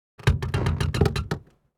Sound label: Wood short and heavy creak earthquake designed